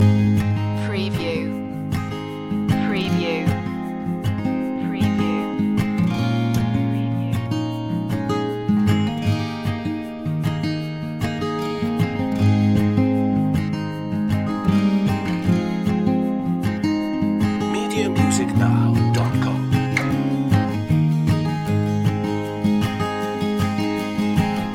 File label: loop